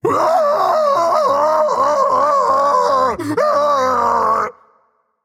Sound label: fear, voicepack; smite; jorm